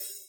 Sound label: soft hit normal